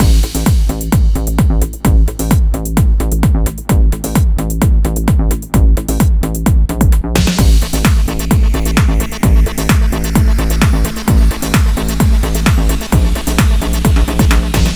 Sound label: Full, 130bpm, Nu Dance Electro; Zero-G; Soundclash; Acidized Wavs; Construction Kits; Sound Factory